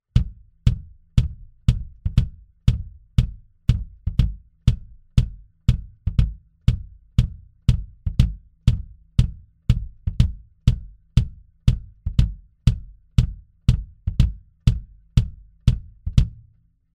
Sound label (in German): Kick Resonanzfell; sE Electronics; V-Kick